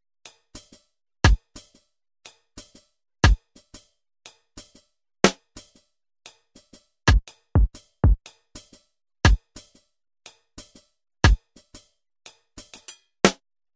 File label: drum seq dry